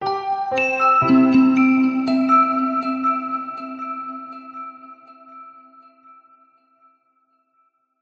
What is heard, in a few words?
multimedia musical logo zen calm piano plucks warm; zapsplat